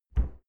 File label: cupboard close fast subdued